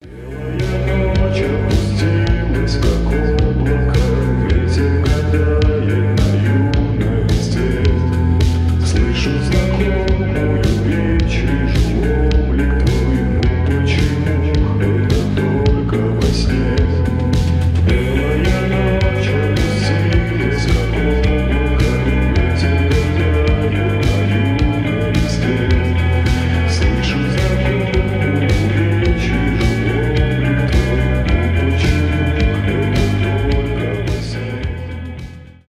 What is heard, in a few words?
slowed reverb